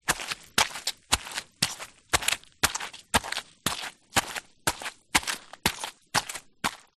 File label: footsteps walking wet forest floor; foley; zapsplat